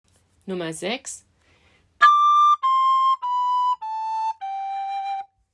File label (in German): Geige